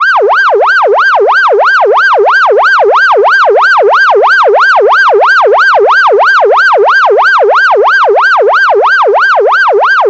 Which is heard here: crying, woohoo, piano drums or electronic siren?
electronic siren